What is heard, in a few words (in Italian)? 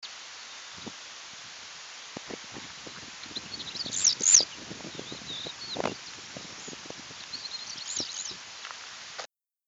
Calandrella